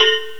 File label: Agogo, Percussive